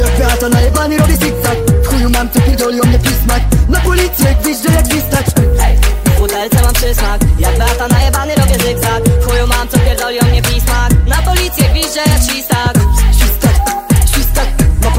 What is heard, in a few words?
nightcore